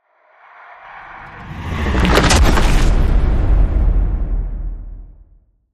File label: Impact Avalanche